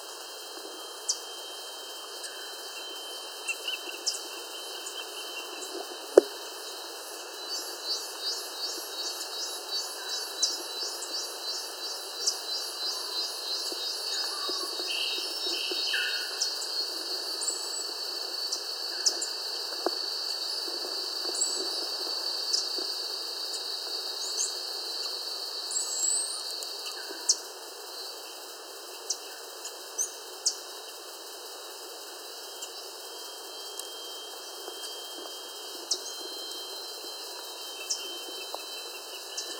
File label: bird